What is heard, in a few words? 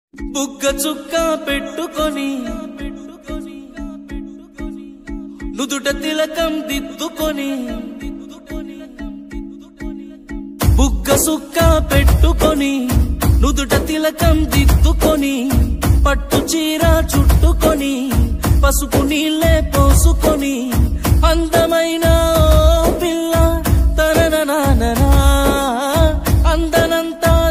folk songs telugu